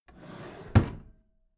packed drawer smooth clatter close regular halfway